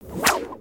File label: Gnarled Torch Spin